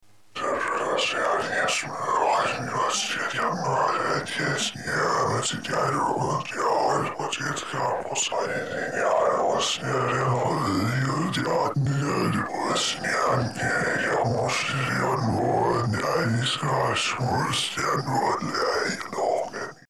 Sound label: Scary Low Whispers; Low Voice Whispers Menacingly; Horror; Monster; Monsters